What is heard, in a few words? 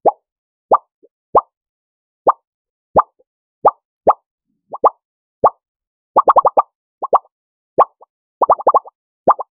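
bubble popping sound, bubbles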